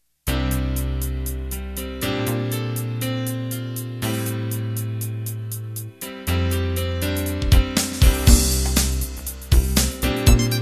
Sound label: Fusion Intro